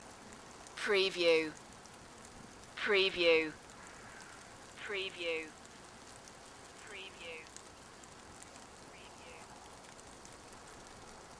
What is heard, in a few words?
light rain with crow loop